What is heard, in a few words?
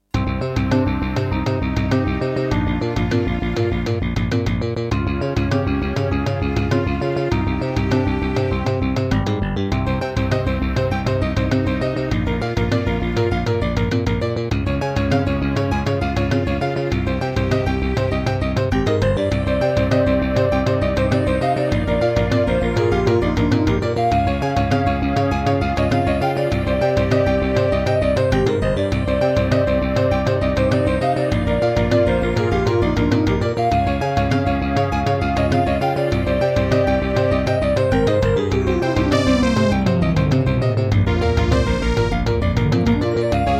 Introduction, Aztech CS9233 DB; Hocus Pocus